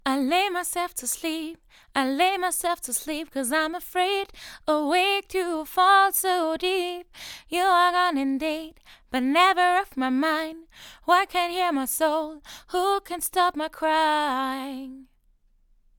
vocals; lewitt lct540

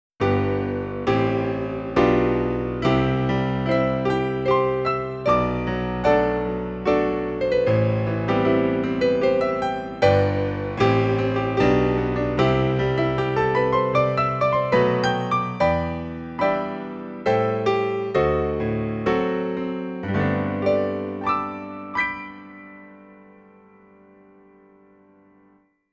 px s5000 modern piano

